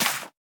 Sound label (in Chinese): 甜浆果丛放置声